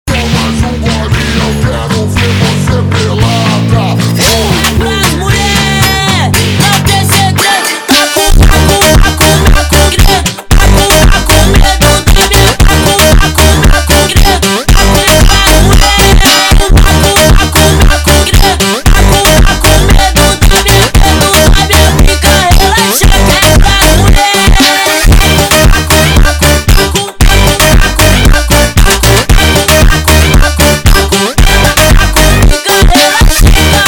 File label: Funk